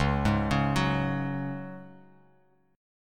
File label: Db Suspended 4th Db; arpeggiated